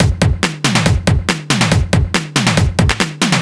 Break Beats, 140 BPM

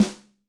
Wood, Snare; Yamaha DTXpress-II

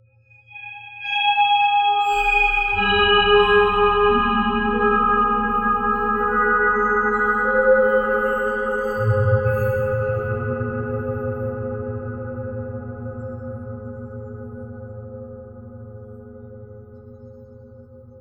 texture, drone pad texture; Polyend; ARC Noise

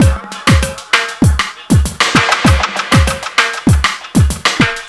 DRUMLOOP, Ragga & Dub Reggae

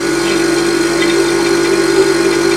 MACHINES

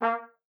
STAM A#3, TRUMPET; Session Instruments; Best Service ProSamples vol.42

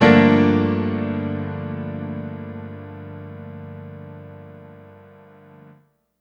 Piano stack (G) min (jazz); Sonic Foundry (Sony Creative Software); Ugly Remnants VOL-2

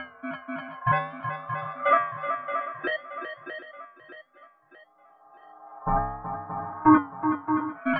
Tape Arp

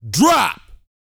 VOX, Vocal, R&B Dance, HIP-HOP

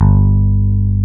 Basses, Roland

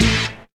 chord A; Techno Trance Essentials; CHORDS

